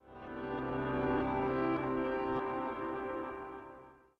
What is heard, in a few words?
Learning chime